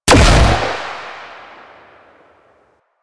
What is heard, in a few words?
Shot Single, Pistol; Weapons; Grineer; Amphis